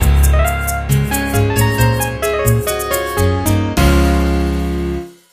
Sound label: jingle